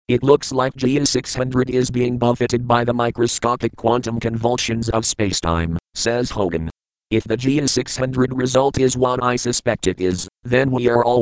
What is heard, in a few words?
Voice Synthesizer